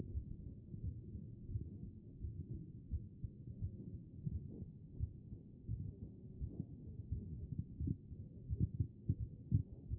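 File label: anterior chest; B MODE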